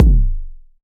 kick flabby

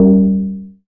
dark metallic tone

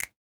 Snap

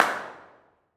Stone Tunnel Cave Hand Clap; AKG C414 XLS omni

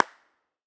Park Hand Clap, Sennheiser MKH8040 cardioid; Sofia Borisovata Gradina Park

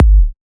kick heavy bass bloom; pro1

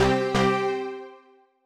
synth first note

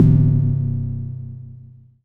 dark dissonant; sys100

